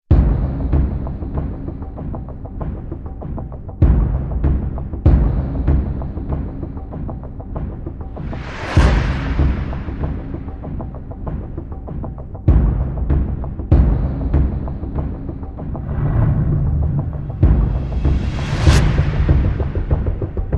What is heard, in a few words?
Drums